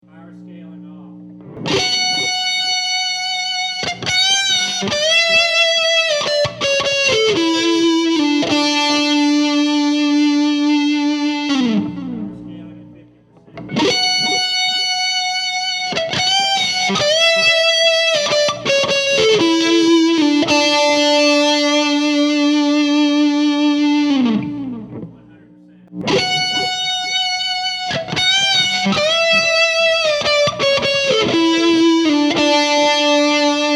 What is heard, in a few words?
Power Scaling Big Muff Mistress